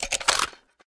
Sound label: mag out, g36; ARC9 uplp fesiug